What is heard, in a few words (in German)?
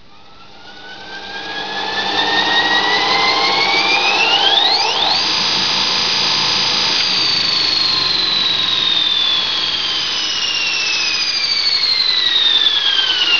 SCHLEIF